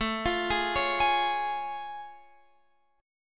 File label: A Augmented Major 7th A Guitar Open F; arpeggiated